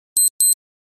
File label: beep